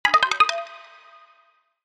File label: Striking Message; HTC; Hollow